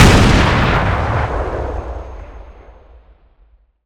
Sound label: m67 explode; explosive; garrysmod